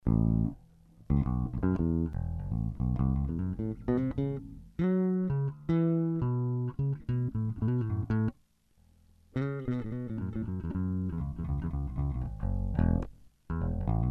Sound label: Riff, Ibanez Jazz Bass